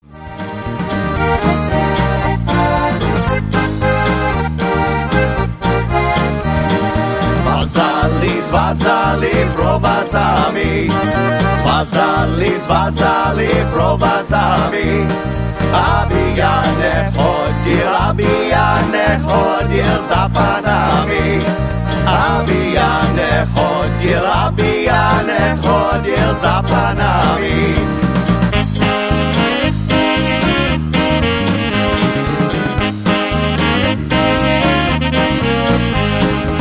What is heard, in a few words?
Polka